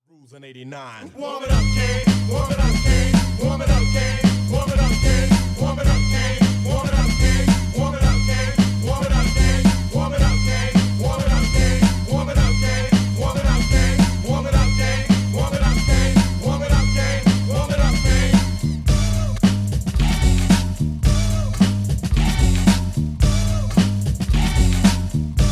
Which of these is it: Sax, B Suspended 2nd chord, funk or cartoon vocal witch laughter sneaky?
funk